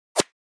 Paintballs flying by fast swish whiz; sport

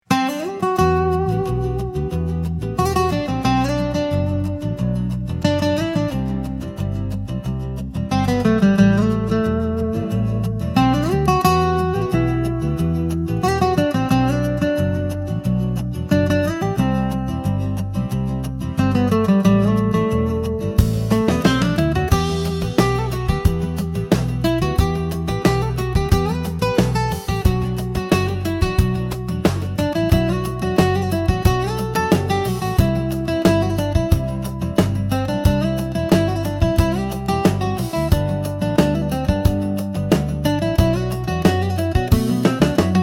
Travel folk warm letters from the hills

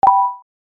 Soft futuristic click select; digital

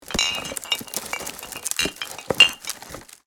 Wicker basket movement bottle clinks inside

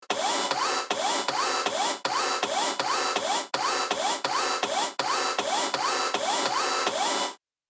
Animal RC toy movement mechanical; rc toys

